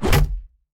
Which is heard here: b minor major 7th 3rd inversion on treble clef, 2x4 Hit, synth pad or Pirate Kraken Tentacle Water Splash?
2x4 Hit